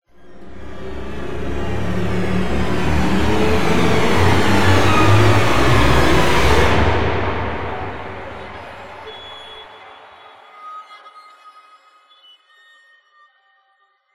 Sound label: Short Music Crescendo; Solus Project